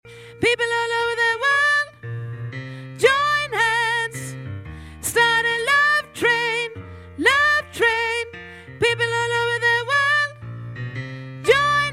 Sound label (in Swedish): sopran